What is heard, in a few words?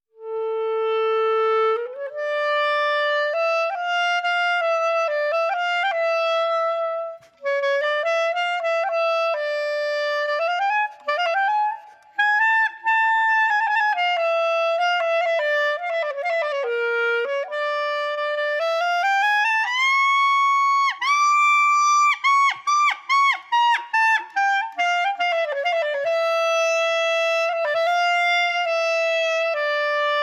mariachi